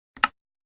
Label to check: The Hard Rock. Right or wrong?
Wrong